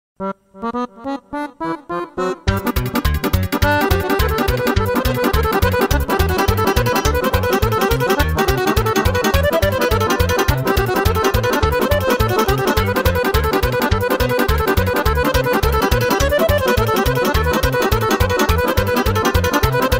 Sarba la acordeon

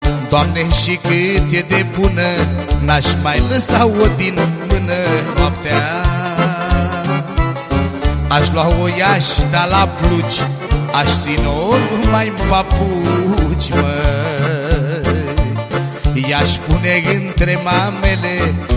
Muzica Petrecere

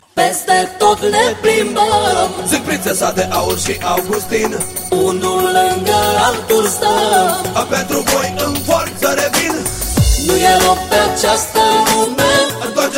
Manele

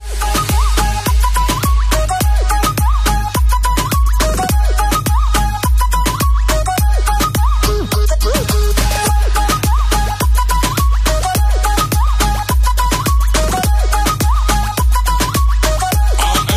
Folclor

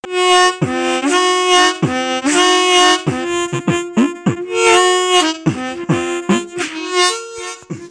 Muzicuta ton de apel

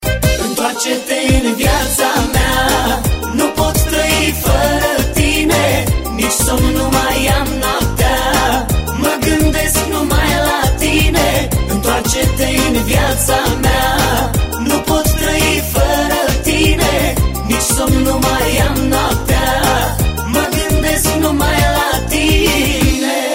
Manea

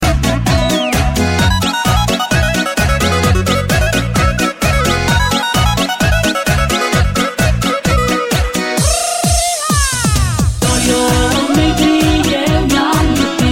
populara

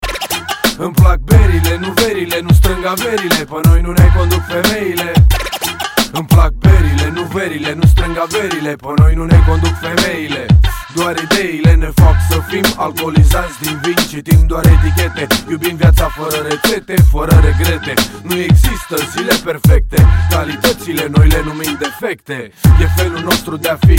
acordeonu

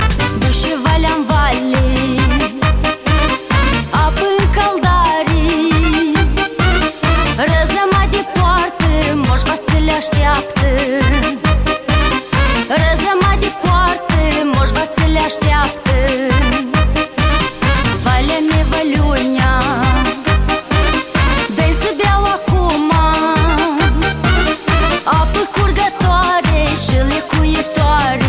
Muzica

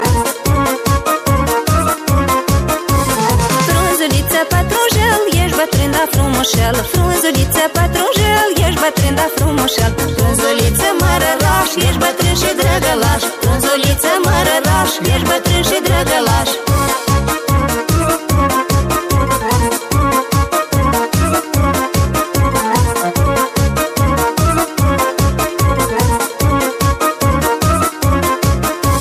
populara

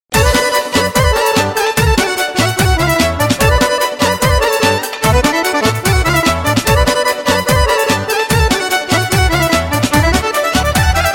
Vioara

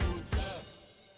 manele

